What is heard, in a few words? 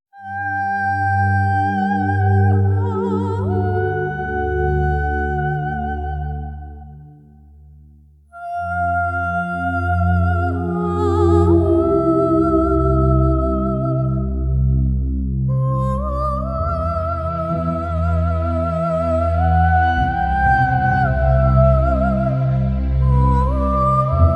Vault Music